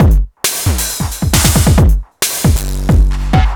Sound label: DRM, LOOPS 135, House; Best Service ProSamples vol.10 - House; AKAI